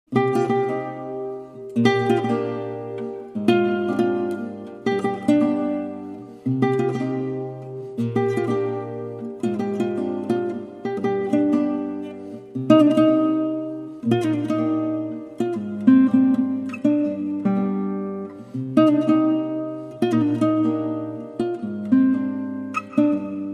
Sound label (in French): Ballade D hiver